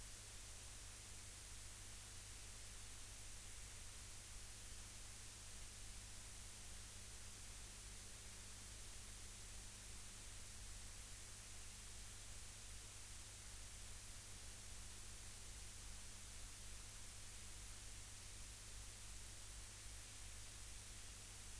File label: rayol farm; SEAMED